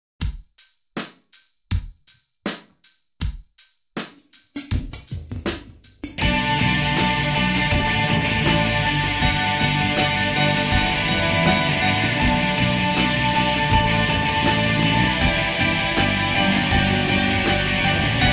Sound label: Tempo 90